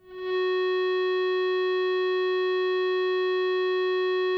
GTR, GUITAR FX

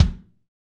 BD, BD REAL

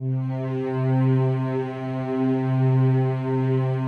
digi pad, Pads

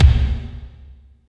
KICK, Tekno Industrial; East West

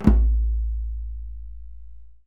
DJEMBE TRILL

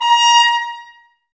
Woodwind Brass Ensemble; Miroslav Vitous

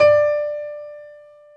PF, B GRAND PF

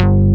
DEEP SYN BS; Pro-Rec - Synthsonic; TRINITY

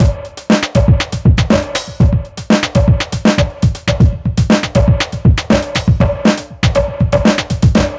akeybreak 120bpm

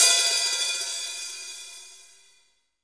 HAT, Hi-Hats, HH Modules; Roland L-CD701

